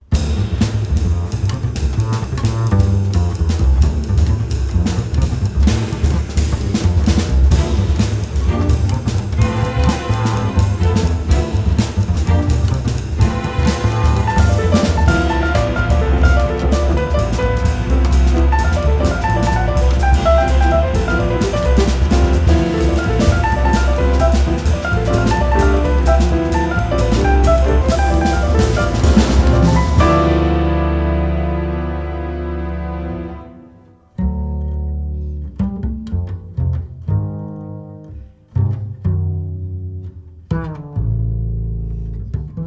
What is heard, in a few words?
sketches for bass orchestra